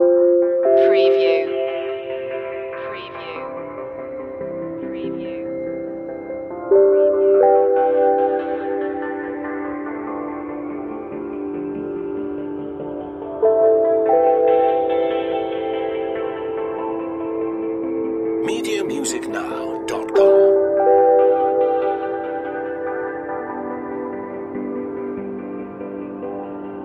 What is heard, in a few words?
emergent lights loop